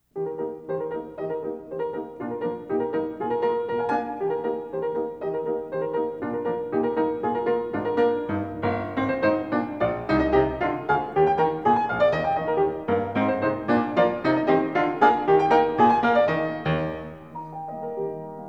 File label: 6 Ecossaises, piano; Ludwig van Beethoven - Complete Works [Brilliant Classics 100 CD Box]; Piano Variations III; WoO83